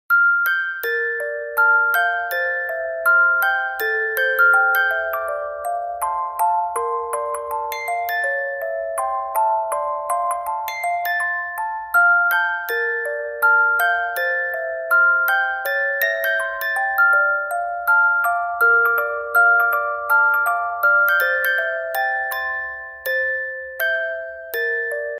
music box

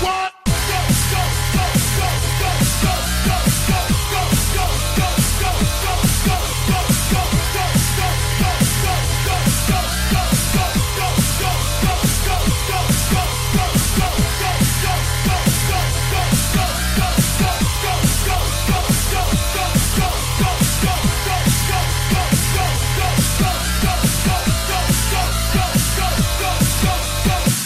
Dubstep

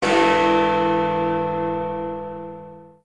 Big Ben one chime

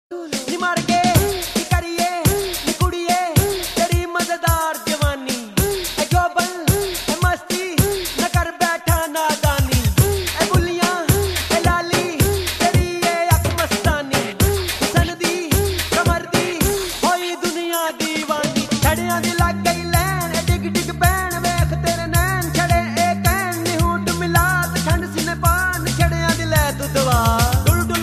Punjabi

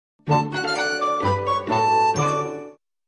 Fairy Tale Tone

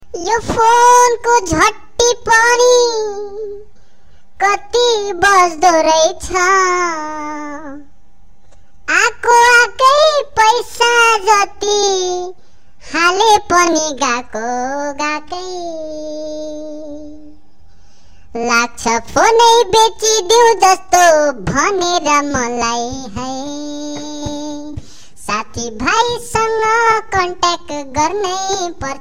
Phone

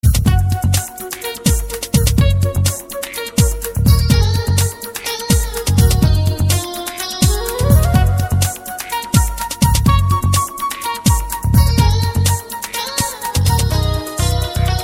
Romantic

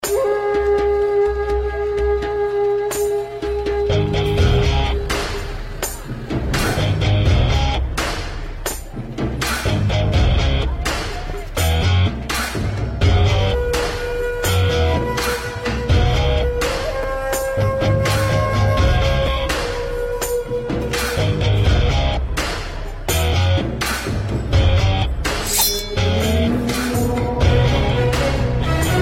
Hero Fight Music

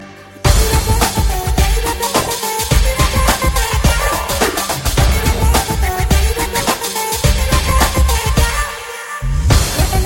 Tech Beat

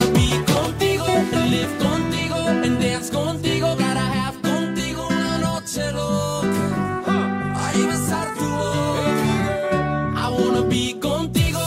Remix

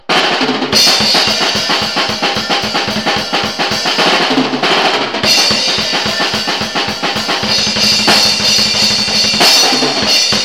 G Solo

